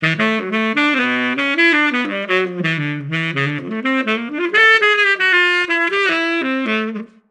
Tuba

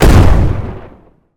Gun Tank Cannon Turret Shot

